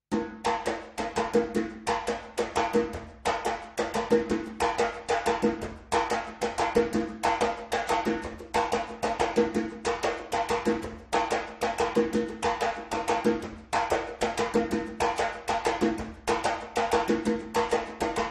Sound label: timbal